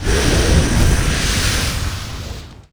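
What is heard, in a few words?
drake fire attack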